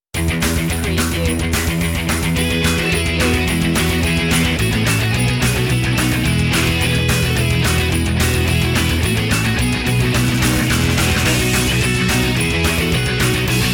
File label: rock the halls deck the halls